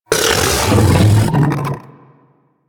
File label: Vocal Burp Attack, critter; Warframe; Hunting Critters; Undazoa